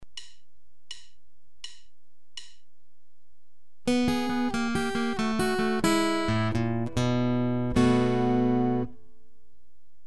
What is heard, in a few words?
Blues turnarounds, guitar